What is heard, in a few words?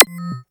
Klick; 300 Drum Machines; DevSnd